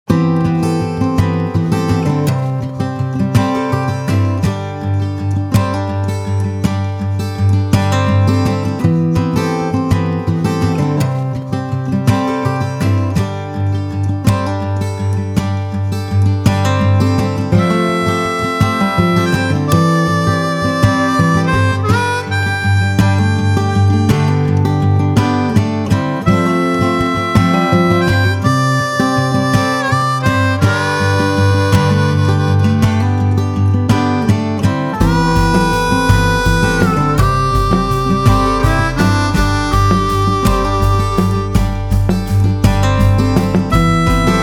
Acoustic Harmonica

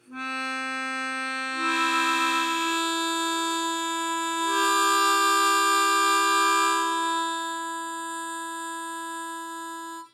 C major 1 into 2 isolating

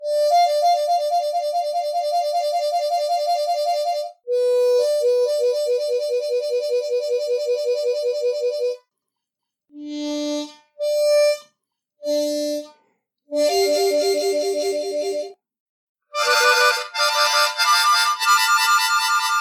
Normal Interval and Sweeps, harp

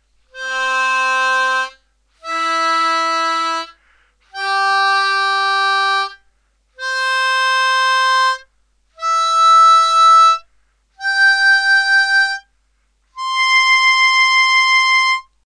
4 hole blow splits

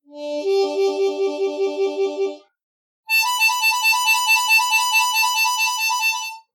1 2 and 6 7 trills

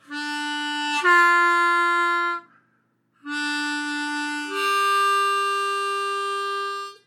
C Major Dodgy 2 Draw, harp

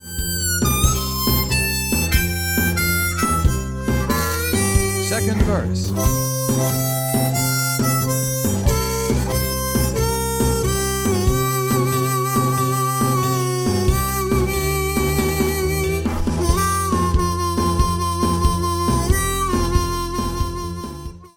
Harmonica Exercise; Winslow Yerxa; Harmonica For Dummies; Exercise 79

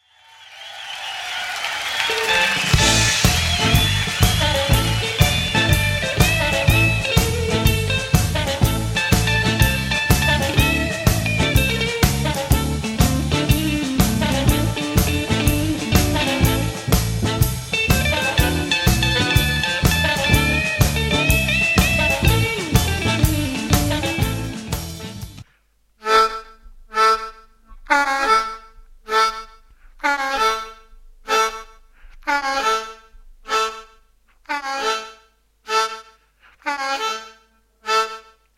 opening riff

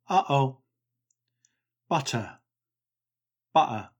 glottal stop

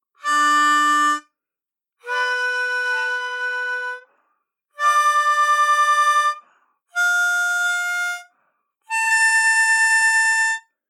Draw Octaves, harp; Neuma

